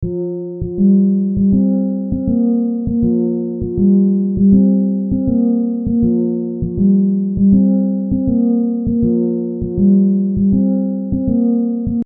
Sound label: instrumental; H2O